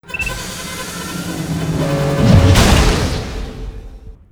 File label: disk; Cosmic Rage; The Experience-light